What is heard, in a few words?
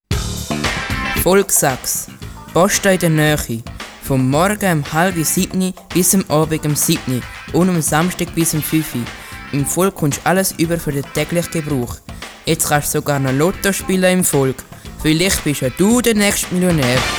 Sax; Volg